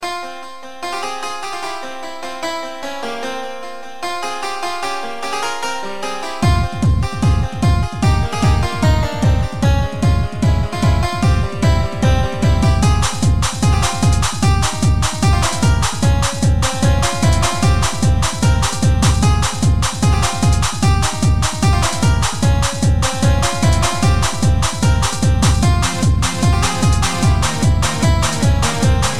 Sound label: Plink